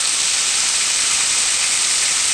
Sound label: frying sausage; Tony Hawk's Project 8; XBOX